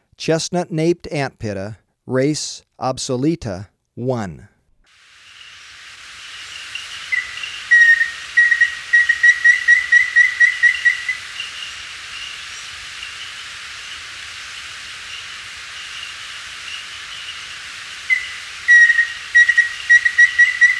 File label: Chestnut-Naped Antpitta, Birds of Ecuador; S. America